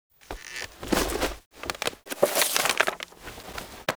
Book Open; Lab; Roathe; Warframe; Tau; Protoframe Room; Ambience

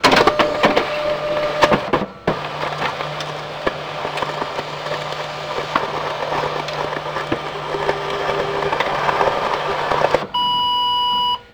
Office Fax Machine; Ambience; Props; Warframe; Nineteen Ninety Nine

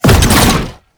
Wall Climb Cast; Warframe; Oraxia

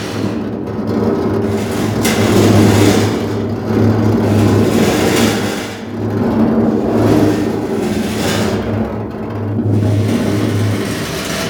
Machine Malfunction Loop; Corpus Railjack

